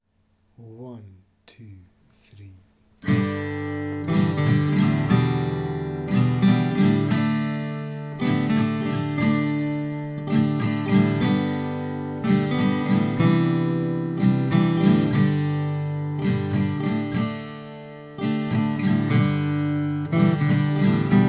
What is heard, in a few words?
Skye Boat Song Accompaniment; slow session